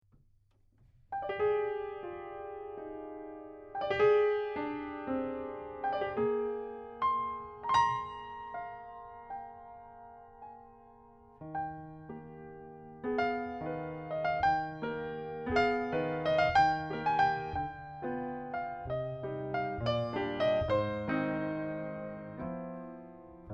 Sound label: Waltzing